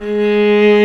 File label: Vla Ab2; Digital Sound Factory Virtuoso